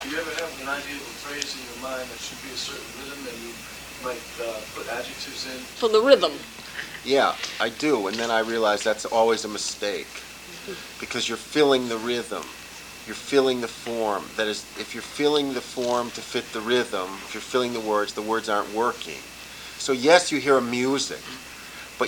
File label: rhythm